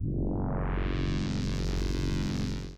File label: pads